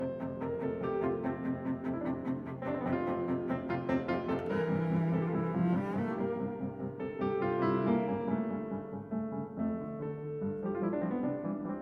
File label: Sonata for Cello and Piano in F, Allegro